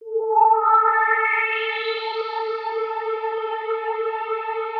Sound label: Moving